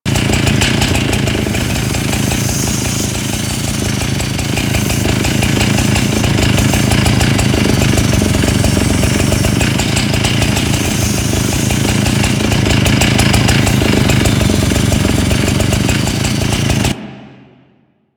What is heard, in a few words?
Saw Idle Loop; Weapons; Melee; Warframe; Grn Ghoul Saw Player